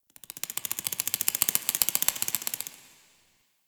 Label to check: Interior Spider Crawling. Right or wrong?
Right